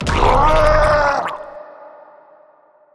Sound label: scream gurgle hi